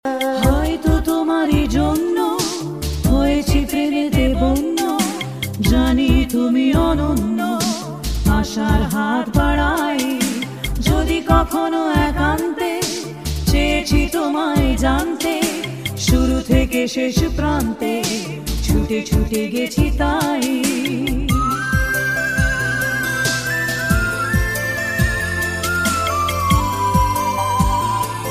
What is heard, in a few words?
Bengali Ringtone